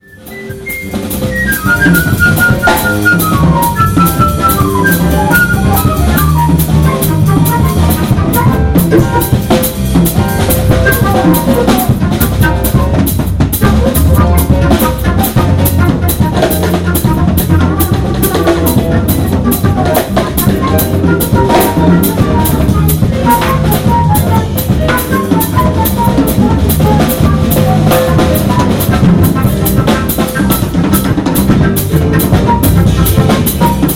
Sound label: maraca live